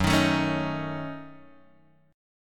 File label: F sharp Minor 6th, Guitar Drop D